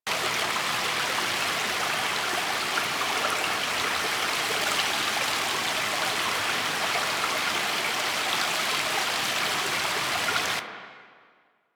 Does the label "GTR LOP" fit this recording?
No